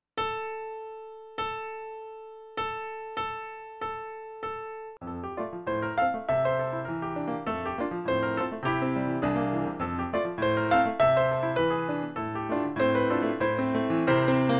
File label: piano; Brahms